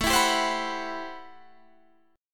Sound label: A Minor 13th A Guitar Half Step Down